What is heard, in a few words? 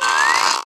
Taunt springrider squeak